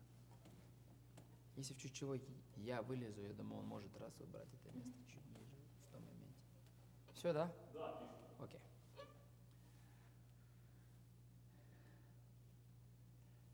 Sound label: Overhead